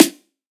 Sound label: Dubstep Snare; Ghosthack; Dubstep Sound Arsenal